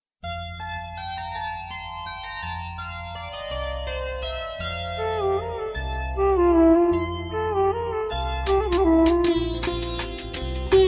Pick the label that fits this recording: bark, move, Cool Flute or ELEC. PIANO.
Cool Flute